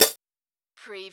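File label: CARTOON CYMBAL MUTE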